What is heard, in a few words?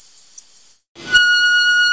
brake squeal